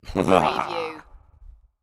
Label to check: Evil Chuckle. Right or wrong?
Right